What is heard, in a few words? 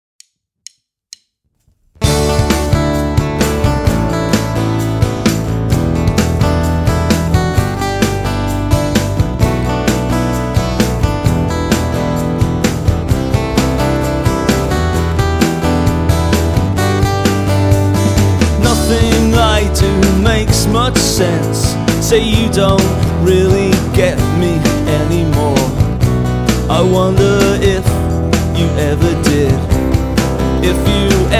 acoustic version; unreleased